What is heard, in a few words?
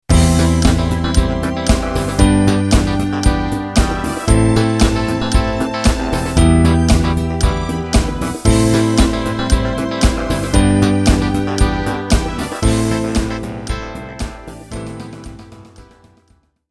bass